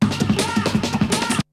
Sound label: BREAKBEATS, TECHNO